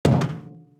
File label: Footstep Armor Heavy; Footsteps, Armor